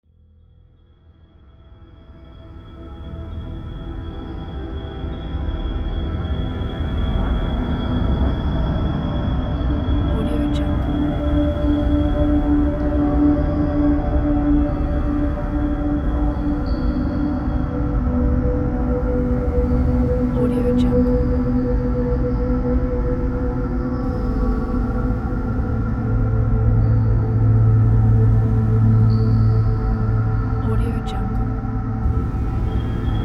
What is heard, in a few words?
dark forest ambient